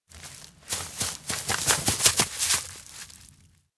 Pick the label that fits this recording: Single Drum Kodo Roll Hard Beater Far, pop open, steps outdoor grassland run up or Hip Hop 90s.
steps outdoor grassland run up